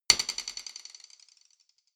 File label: drumstick hit move hard